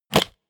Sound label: mechanical switch wood medium push; industrie-wirtschaft; mechanik